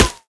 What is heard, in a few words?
foliage, bullet impacts; physics; Half Life Model Pack